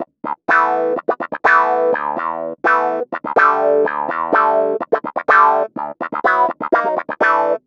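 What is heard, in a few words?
125 NuJazz Clavinet E major; Piano; Clavinett; Pro Tools Essential Loops; Digidesign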